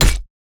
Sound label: hammer